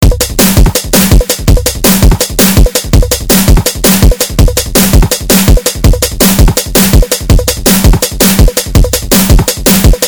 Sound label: Loop